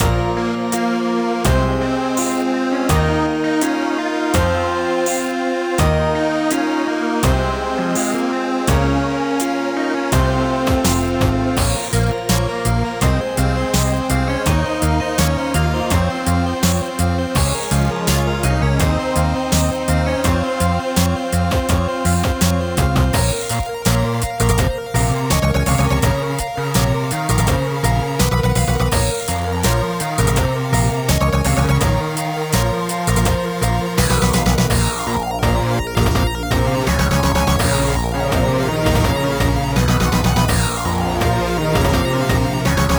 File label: Shores; Imprisoned Light